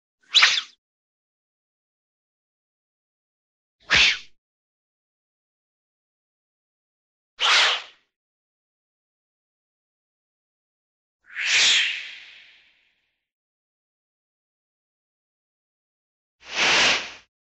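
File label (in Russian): резкий свист словно плётка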